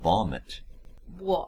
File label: vomit